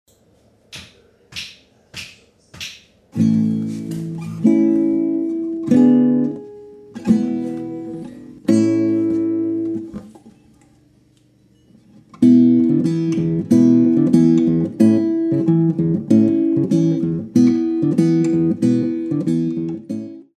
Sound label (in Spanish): Guitarra